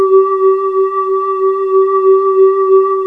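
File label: SOLO SQUARE; FMSTORY#2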